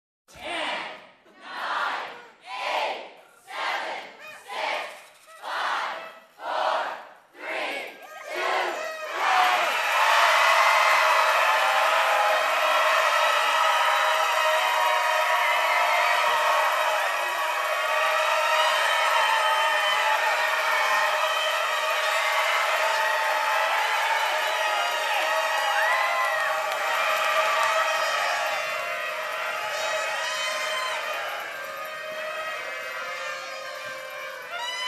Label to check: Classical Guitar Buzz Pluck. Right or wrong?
Wrong